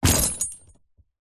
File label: horse saddle leather metal set down jangle